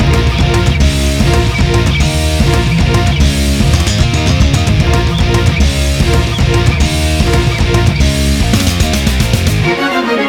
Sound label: boss battle loop; nekocharm